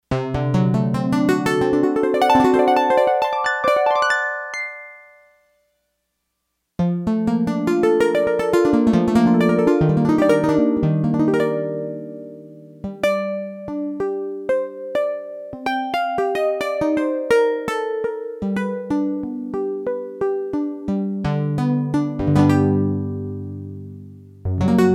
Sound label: Harp, Yamaha TX802; Performances; Factory Patches